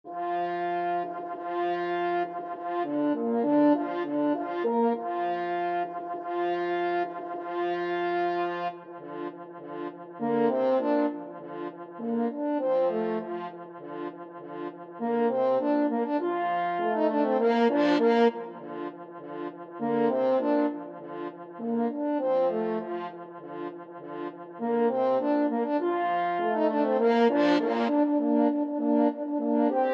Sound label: french horn duet